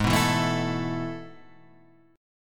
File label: A Major 7th Suspended 2nd